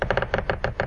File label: quick peck